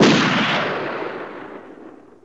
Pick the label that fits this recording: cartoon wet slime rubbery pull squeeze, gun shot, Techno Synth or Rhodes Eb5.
gun shot